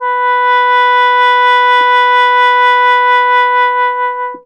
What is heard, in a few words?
sheng b3; WORLD INSTR 2; WOODWIND AND BRASS; PS SHENG; World Instruments 2